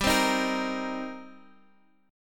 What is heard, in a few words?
D sharp 6th, G